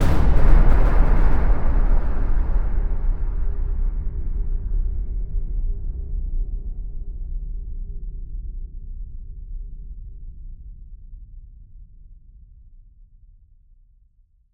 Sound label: Cinematic Metal Impacts; the forces bomb us out